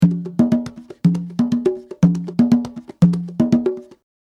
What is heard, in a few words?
Conga Groove